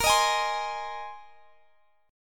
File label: A sharp 7th Suspended 2nd Suspended 4th A sharp, Guitar Standard; strummed; x,x,20,20,21,20; chord; JGuitar